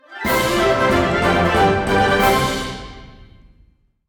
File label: Btl Jingle Win